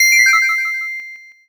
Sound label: ice magic